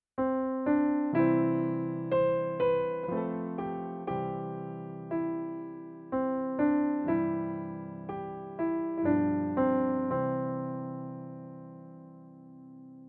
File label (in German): Instrumental Playback C-Dur; Die letzte Rose